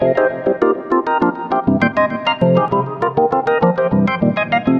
OrganEyes; Sonic Foundry (Sony Creative Software); Ugly Remnants VOL-2

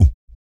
Vocal, Blues & Soul; ILIO; Vocal Planet VOL-2

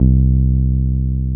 TB 303

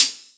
Open Hihats, Cymbals

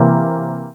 chord

